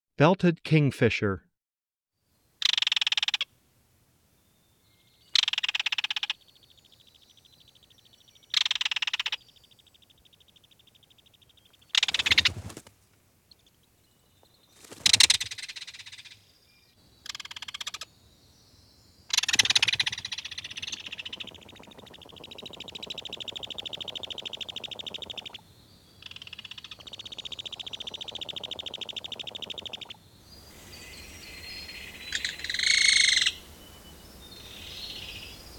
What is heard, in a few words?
belted kingfisher